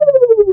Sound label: CHAT REVERSE; OpenRA